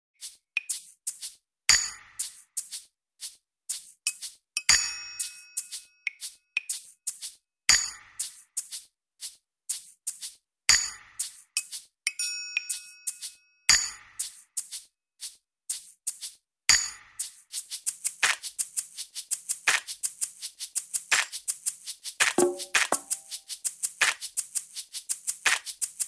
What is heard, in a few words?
Click Tracks for Gospel